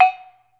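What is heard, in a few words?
PANARUS, Metalophones, Pitched Percussion; E-MU Producer Series Vol. 7 – Old World Instruments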